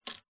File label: leisure lego piece single set down on wood table